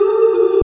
ooahoooh; Truth-Hz - 1-Hit KO Sample Pack

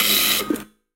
Metal Air, Digital FX; TRUTH-HZ; Crowdsourced Sample Pack

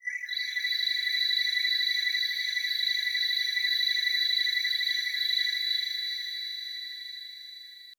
digital forest, Digital FX; TRUTH-HZ; Crowdsourced Sample Pack

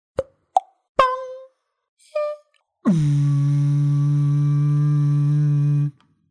leads and bass one-shot; Sound FX; Crowdsourced Sample Pack

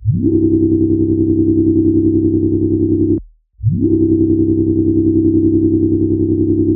bass; TRUTH-HZ; Crowdsourced Sample Pack; Beam bass

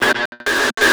glitches, Digital FX; TRUTH-HZ; Crowdsourced Sample Pack

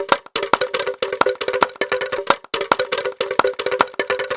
bamboo clap; Crowdsourced Sample Pack; TRUTH-HZ; Drum Kit; Percussion

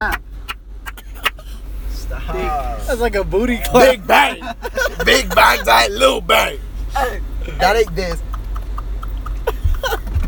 clap, Foley; TRUTH-HZ; Crowdsourced Sample Pack

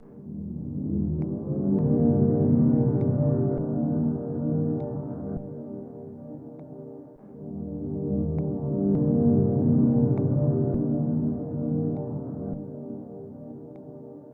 Sytrus Pad Resample; Crowdsourced Sample Pack; Pads

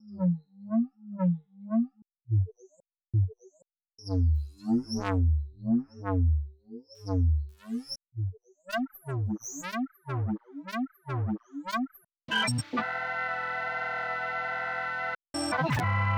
oscillations harmonics; TRUTH-HZ; Crowdsourced Sample Pack; Digital FX

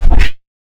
whipp, Digital FX; TRUTH-HZ; Crowdsourced Sample Pack

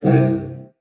BRASS, Brass & Wind; Crowdsourced Sample Pack

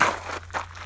Walkin, Foley; TRUTH-HZ; Crowdsourced Sample Pack

